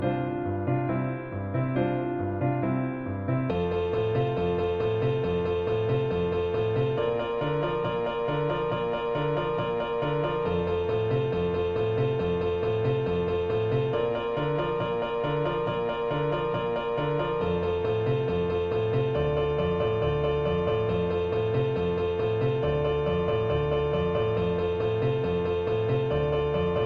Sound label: accompaniment; mamma mia vocal audition materials